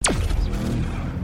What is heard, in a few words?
Teleporter; Fortnite